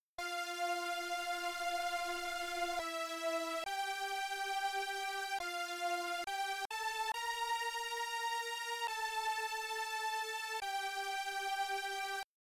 St リリース速い

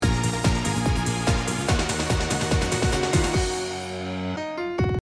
リバースピアノ Aメロブレイク

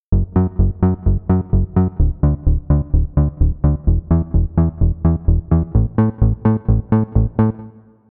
デモ曲（ベース）

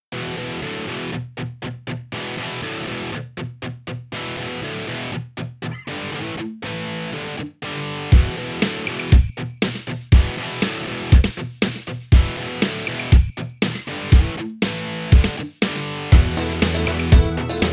ループ素材サンプル曲（コード追従なし）

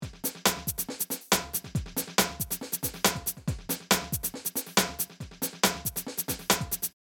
シェイカー Loop

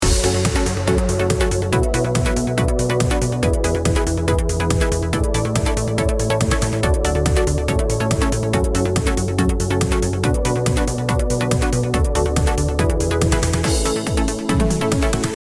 シンセバッキングあり

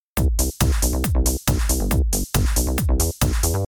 ベース 動き

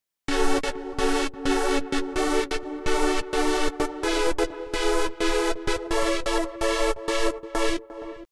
バッキング

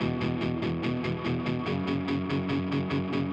ブリッジミュート

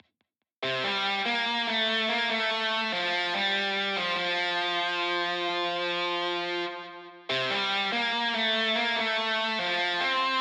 スライドなし

